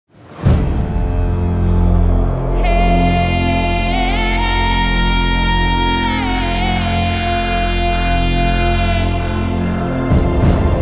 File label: desert wail